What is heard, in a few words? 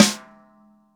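snare, GM drum set; AKAI S-Series CD-ROM Sound Library VOL-8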